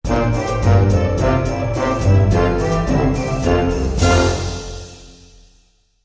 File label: Nutcracker March, Sting